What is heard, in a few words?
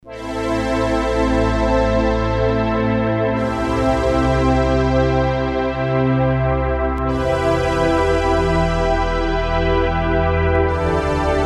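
Soft Synth Orchestra; Digital Synthesizer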